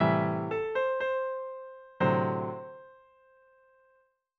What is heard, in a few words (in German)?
motiv harmonisiert